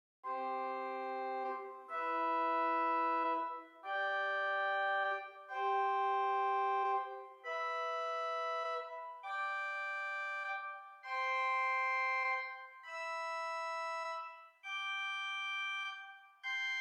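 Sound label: Swell Mixture arpeggio, organ; Hauptwerk; St Annes Moseley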